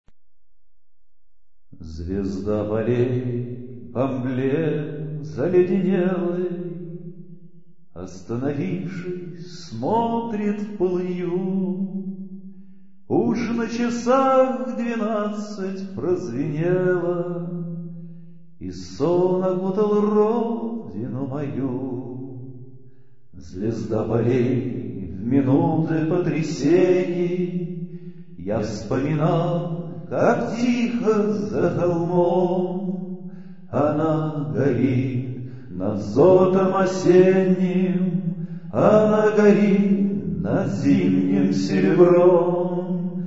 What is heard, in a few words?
vocal